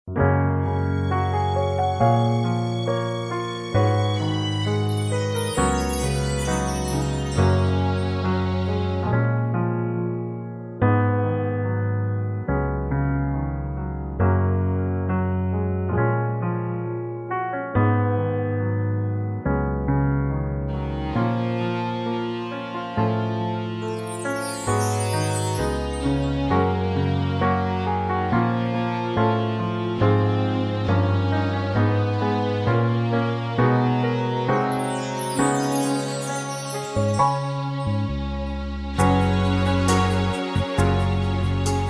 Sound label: (Gb To Ab) No Backing Vocals